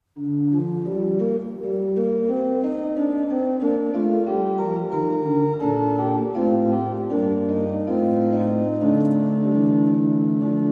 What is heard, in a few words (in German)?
halleluja